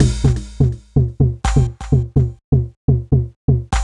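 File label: perc waiting, Classic Chicago House Percussion Loops, 125 bpm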